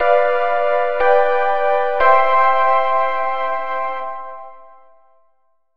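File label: upgrade level; xpro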